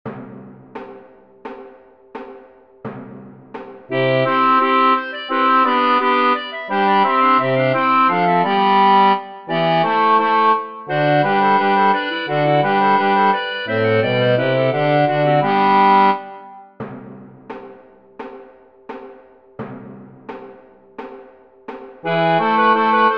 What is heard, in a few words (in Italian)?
Coro 4 Bass